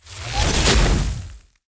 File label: tower arcane wizard skill disintegration